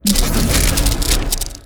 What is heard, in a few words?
click dismantle starbase; apocalypse